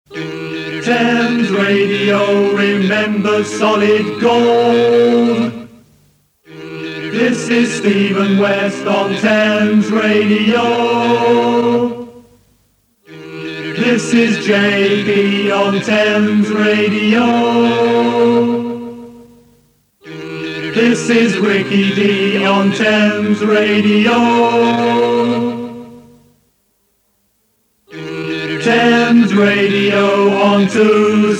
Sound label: Radio London Jingles; Thames Radio